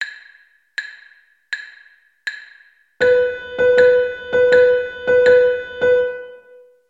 Dotted 8th 16th

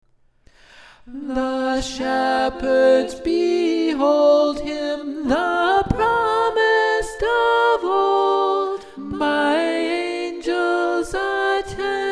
Alto; O Mercy Divine

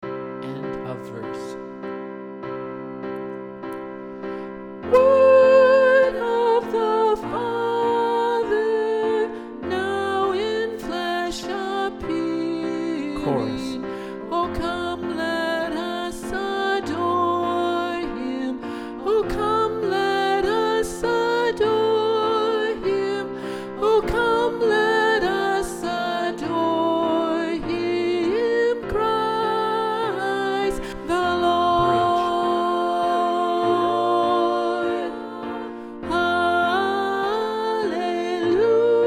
O Come All Ye Faithful Soprano

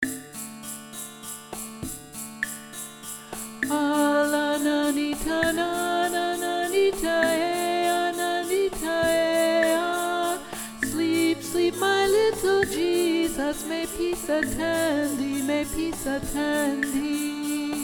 Alto; A La Nanita Nana

